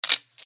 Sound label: click tile